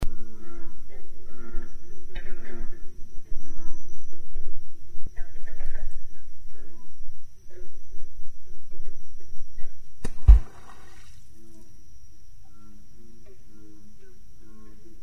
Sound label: beaver splash; Beaver Slapping Water in said Swamp, August, / 2004